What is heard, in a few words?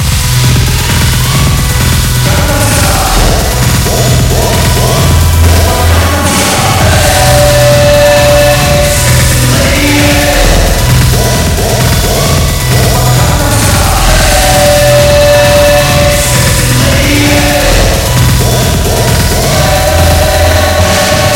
Basement